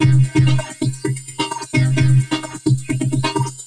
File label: Cylon Beats (130BPM); sci-fi